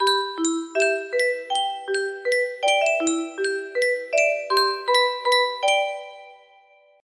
music box melody, 15 notes

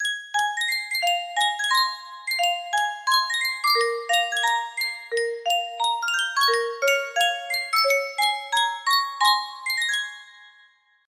Sankyo music box

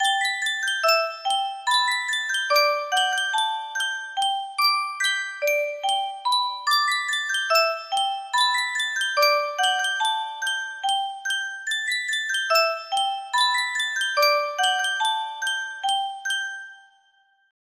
yunsheng custom tune music box, music box melody

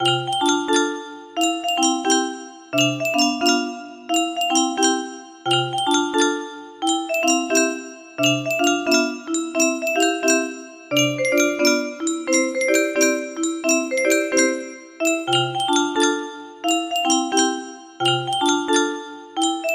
carillon music box melody

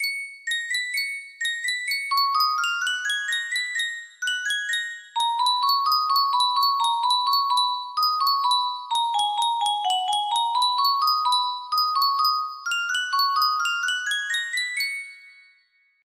music box canon in D, music box melody

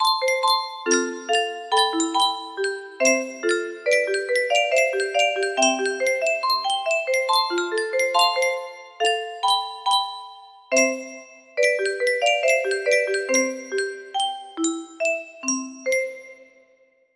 music box, music box melody

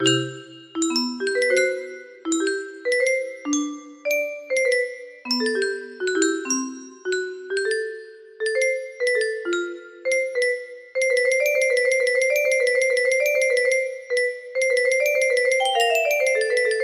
music box melody

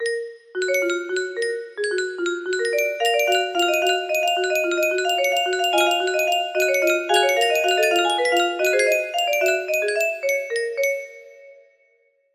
alert, music box melody

